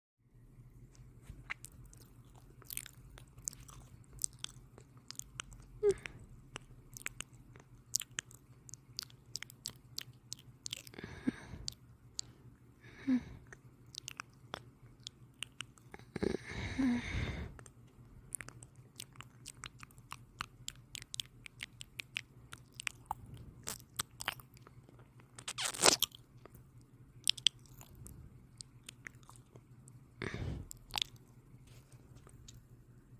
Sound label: Mouth noises